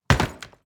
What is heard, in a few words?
Small travel suitcase setting down